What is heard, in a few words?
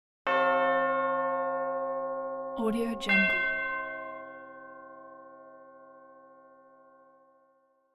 dark ominous bell tower